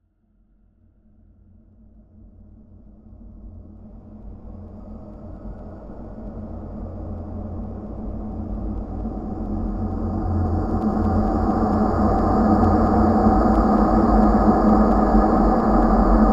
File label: sound design eeiry dark supernatural drone